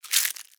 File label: paper rip